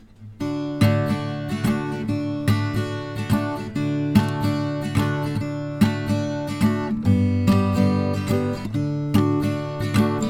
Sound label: jingle bell